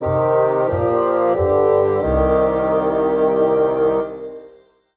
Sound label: You Lose; ArmyRaid; Interface and Item Sounds